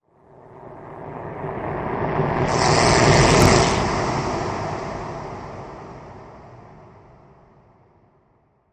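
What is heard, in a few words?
Reverse System Crash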